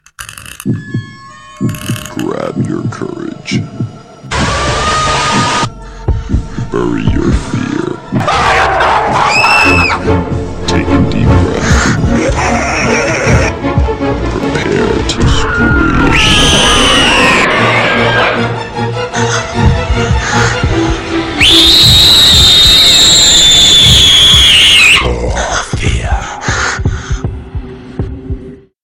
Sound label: ringtone, remixed horror mix